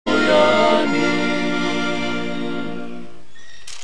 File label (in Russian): Ob penie; Для семейных; Лагеря; 2006 semejnyj-lager-apscheronsk; O kak blaschen